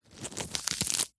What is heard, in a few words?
food spinach leaves crush squash twist; zapsplat